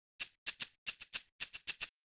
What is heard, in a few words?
happy shaker